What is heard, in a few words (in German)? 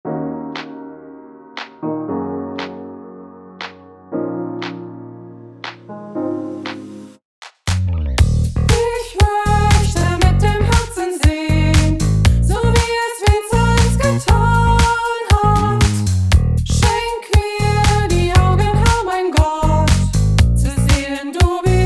Vinzenzlied Funk Remix Strophe 1; vinzenz von paul; 25 Jubilaeum